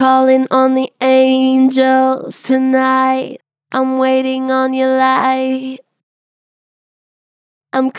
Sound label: Str Flat Callin on the angels tonight, Vocals, Pitch Flat Strong